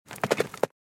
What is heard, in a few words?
Horse; Microsoft Studios; Age of Empires III - Complete Collection; cinematics